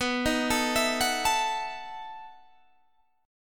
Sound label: B 11th B Guitar Open G, chord